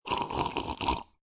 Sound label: Slurping Liquid, Human, Slurping Liquid, Single Slurp Of Water; Drinking